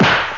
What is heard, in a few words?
punch